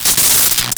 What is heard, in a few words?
bolt explode, boltgun; weapons; Dystopia